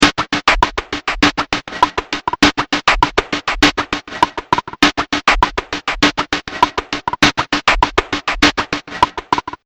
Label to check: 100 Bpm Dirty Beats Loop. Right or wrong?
Right